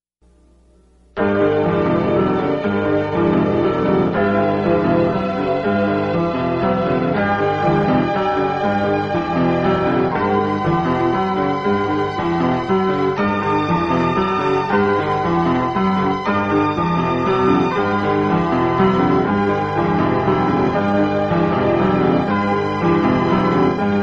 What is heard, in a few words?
Instrumental